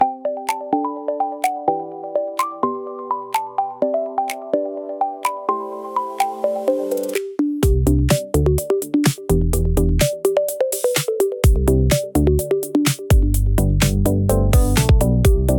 Morning Pixel Rinse, soft electro for scruffy modern vlogs